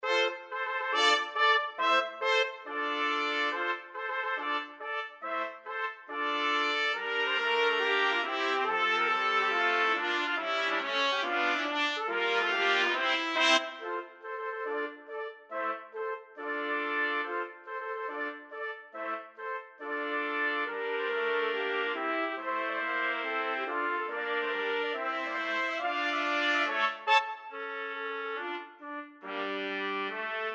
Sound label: nutcracker trumpet